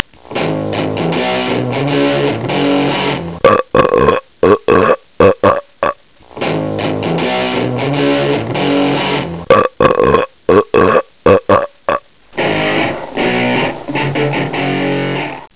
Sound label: machine